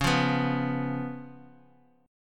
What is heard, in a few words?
C sharp 7th C sharp; strummed; chord